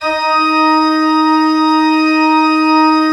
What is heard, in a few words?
D3, Cathedral Organ; ILIO; Propeller Islands Cathedral Organ